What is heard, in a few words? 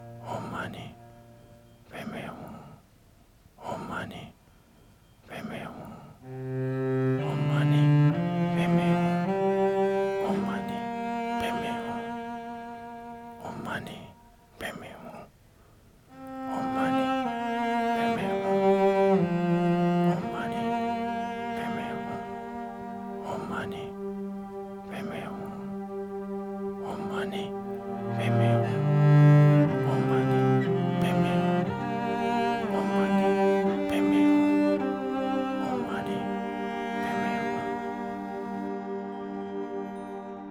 Tibetan Meditation Music